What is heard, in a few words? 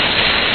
slide; openspace3d; fps navigation